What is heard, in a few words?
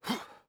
swing release